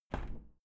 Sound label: window plastic squeak open regular